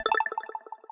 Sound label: chain